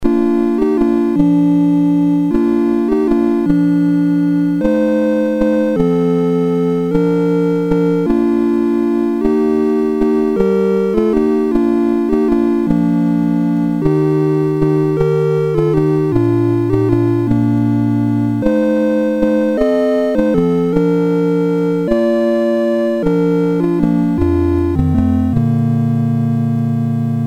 Christmas Album BASIC, Commodore MOS6581R3; C64

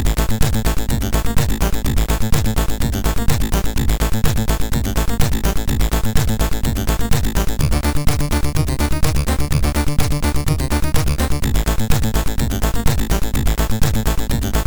Short Intro Tune, MOS6581R4; hvsc

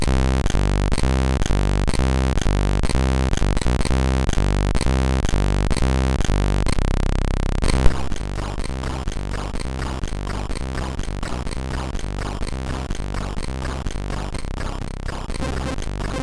sid, MOS6581R3

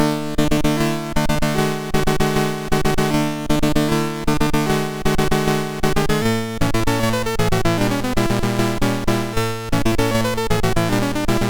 Fanfare, MOS6581R2; Master Composer

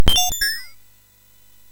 sfx, MOS 6581; hvsc

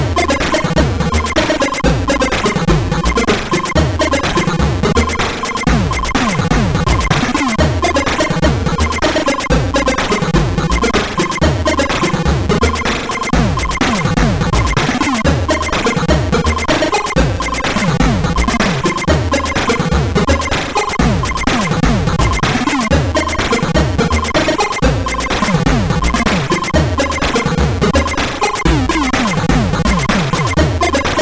Crazy Music, sid CSG8580R5

